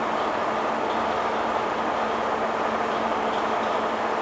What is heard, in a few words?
loop; Math Mysteries; Tom Snyder Productions